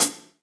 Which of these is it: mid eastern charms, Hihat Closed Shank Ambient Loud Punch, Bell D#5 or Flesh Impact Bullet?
Hihat Closed Shank Ambient Loud Punch